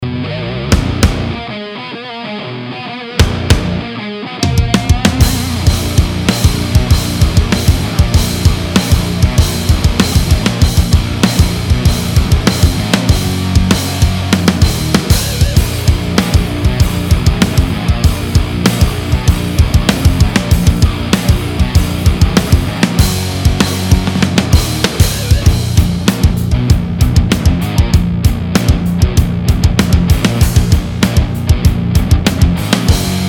drums

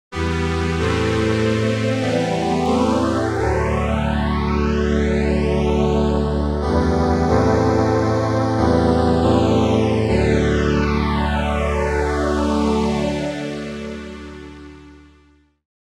phased; stringer

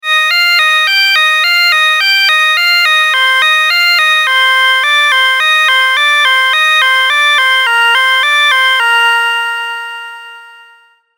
serum synths; chiqui audio

